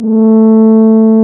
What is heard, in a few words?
TUBA